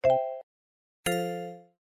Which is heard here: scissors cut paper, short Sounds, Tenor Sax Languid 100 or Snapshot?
short Sounds